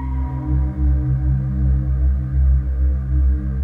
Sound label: C2, Choir Acoustic & Synth; USB Soundscan vol.28 - Choir Acoustic & Synth; AKAI